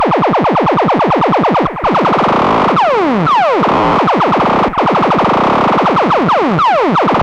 monodelay, Recorded with monotron delay and monotron - analogue ribbon synthesizer; instruments; loops